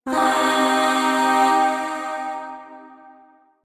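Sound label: Shawzin Pentatonic Minor Chord; Warframe; Zariman; Void Shawzin; Chords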